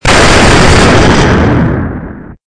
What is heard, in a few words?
explosion